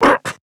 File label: Grunt Hit; Androxus; Paladins